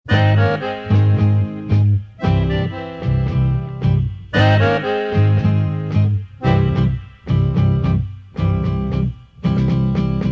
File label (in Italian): tango bolero